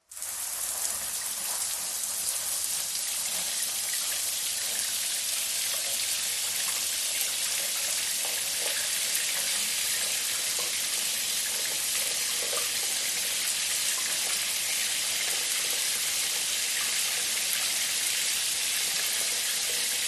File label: household shower on run off long modern close ground level recording